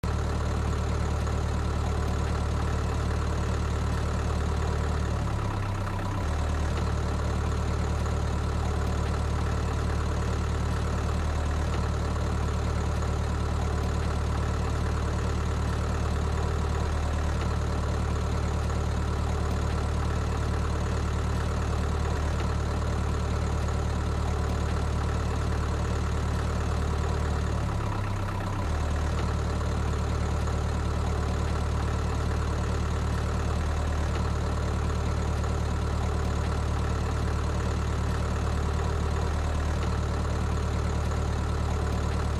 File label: Motor